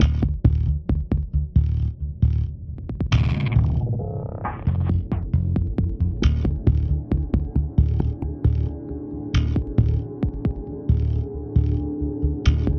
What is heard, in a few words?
Pre Start Music; Kolbeinn